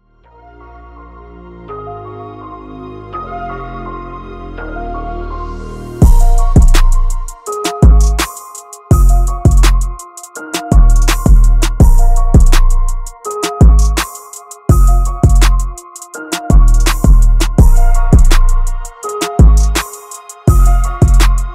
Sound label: instrumental ringtone